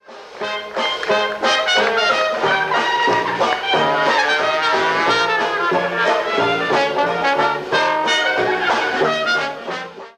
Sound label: acoustic quintet banjo bass clarinet trumpet trombone